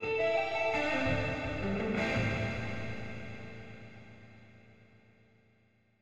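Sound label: Guitar Loop Stereo Ambience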